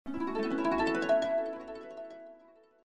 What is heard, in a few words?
jack open, Sony Ericsson; Z550i